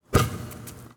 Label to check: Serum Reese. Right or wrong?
Wrong